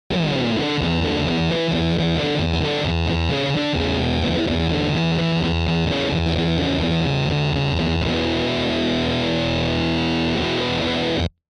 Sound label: DFST-1 Lo Overtone Lo Speed